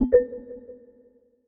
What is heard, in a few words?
new message